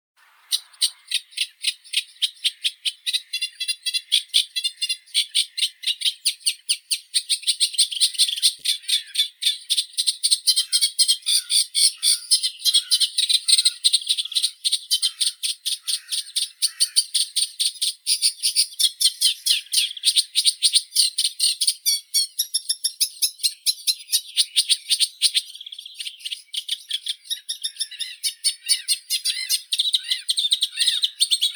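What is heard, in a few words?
reed warbler, acrocephalus scirpaceus; kamishovka trostnikovaya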